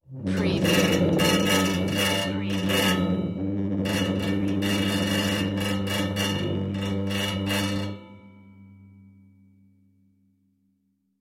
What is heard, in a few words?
move metal furniture